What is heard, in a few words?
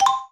PUZZLE Success Xylophone Two Note Climb Bright Dry; stereo; Universal Sound FX